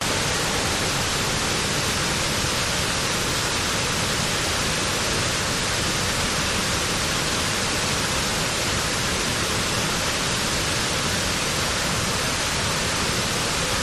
waterfall lateral left front closer people chatting; bridal falls; your free sounds